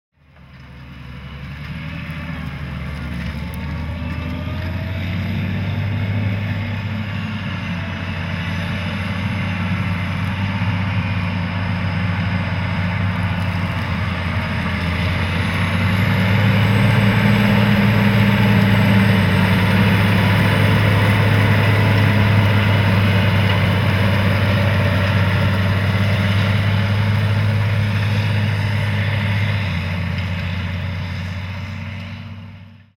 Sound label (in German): Claas Challenger 95E, Motor: Caterpillar; Leistung: 416 PS